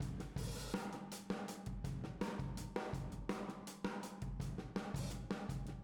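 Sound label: Boogaloo, Room Mono, 82 BPM; Drums; Verse; Sonic Foundry; Drums from the Big Room The Mixes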